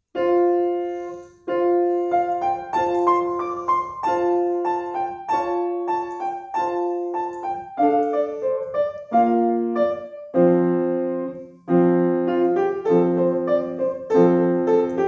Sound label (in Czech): klavir, Cinska pisnicka; M. Rachverger